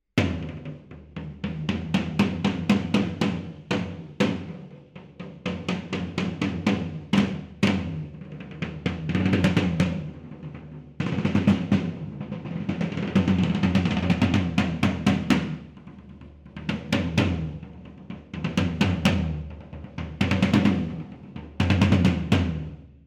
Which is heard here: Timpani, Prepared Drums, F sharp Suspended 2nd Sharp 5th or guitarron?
Timpani